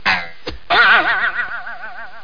BOW ARO, Cartoon; 2000 Sound Effects

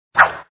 throw; the independent

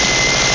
minigun; Zoom - Release 2; Active Software; worms

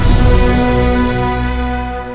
Won Activity; Spelling Made Easy

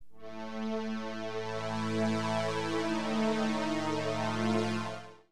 SPK PAD, HipHop, Keyboards; LiveAct Pool 1

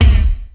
kick

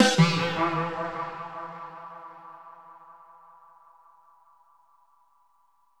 DRUM LOOP ambient 160

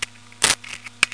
Shuffle, Cards; AfterDark

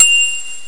PLING; Groovy Bytes: Behind the Moon; DRM PERC

